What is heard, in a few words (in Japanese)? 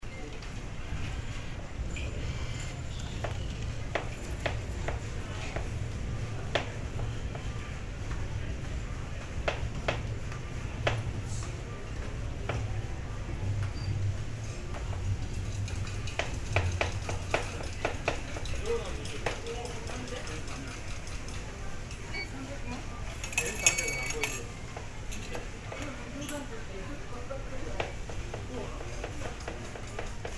駅構内のご飯屋さんの環境音